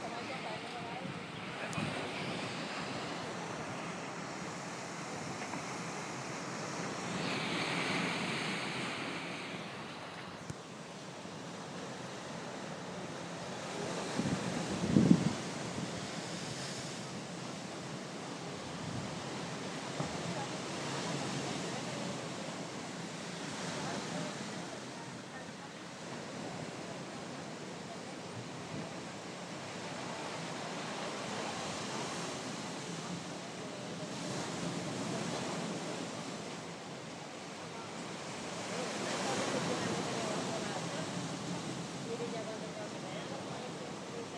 waves building on deia beach

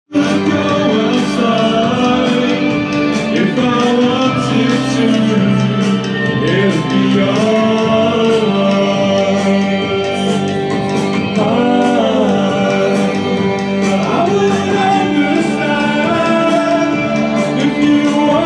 grumbles

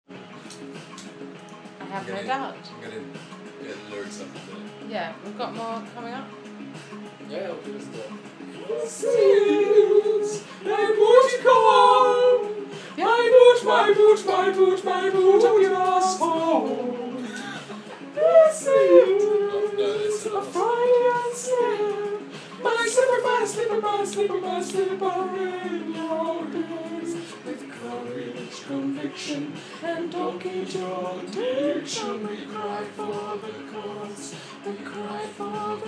friday night ambience

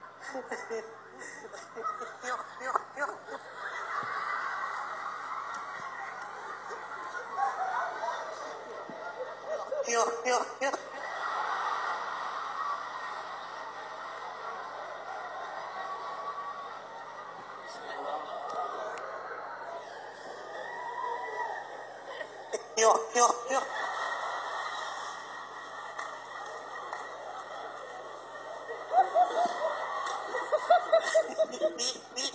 laugh

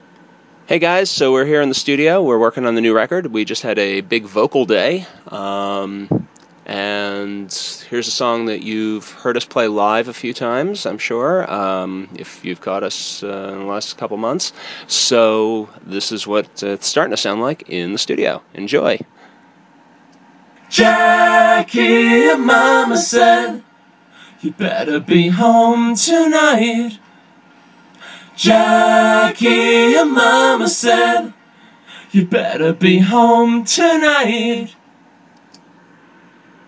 tracking vocals in the studio

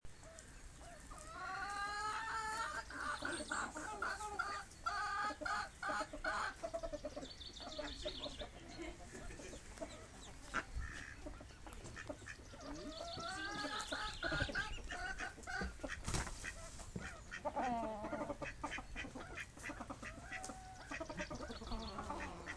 hens ducks on brownsea island

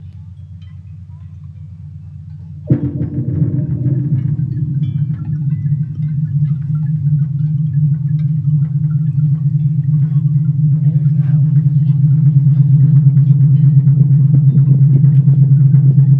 harmonic fields

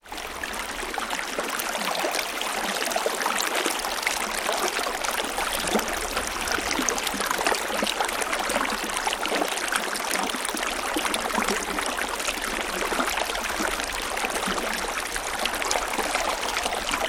water flowing river shannon

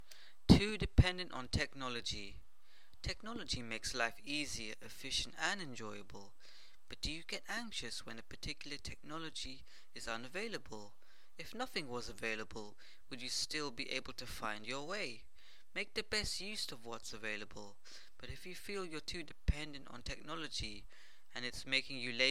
techno

